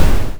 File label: baddie oof